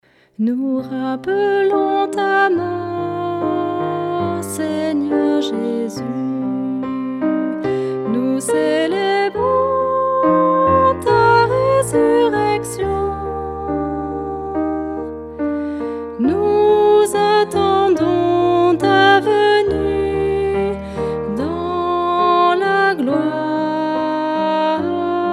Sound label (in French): Anamnese, Voix; Messe De L Agnus